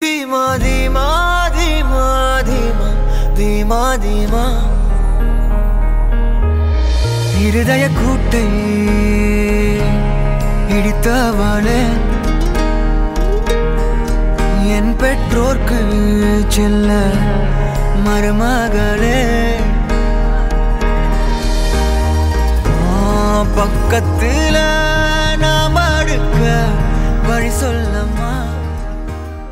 Song Tamil Ringtone; Love Insurance Kompany

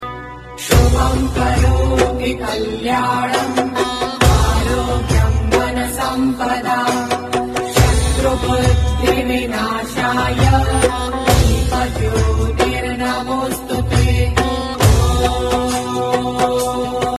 Devotional Ringtone

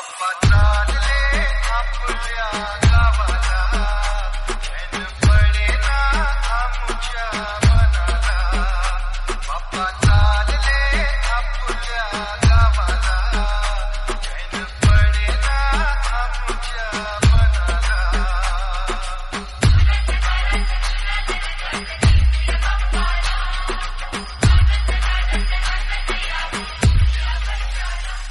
Ringtone

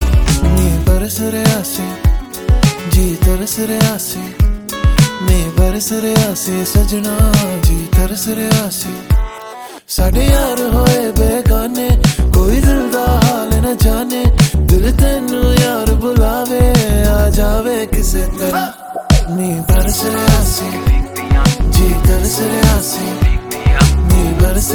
Ringtone

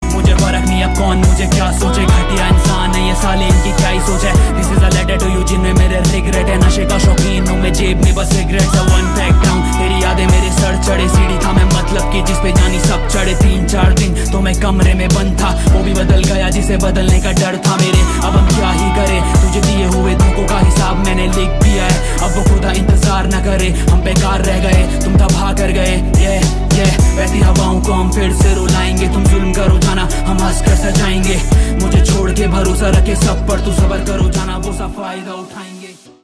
Song Ringtone

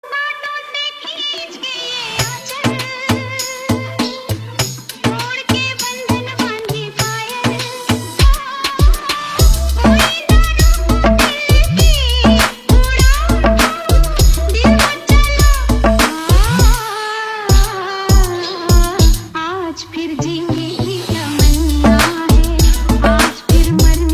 Bounce Mix Ringtone

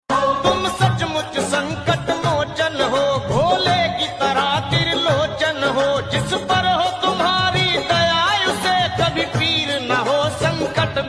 Ringtone

